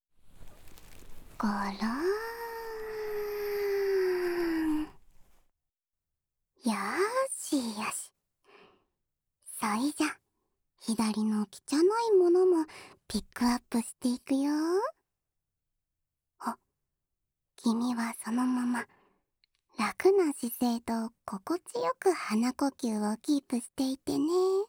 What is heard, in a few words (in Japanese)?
音叉スパイラル（左耳）, ASMR; お導き音叉スパイラル（左耳）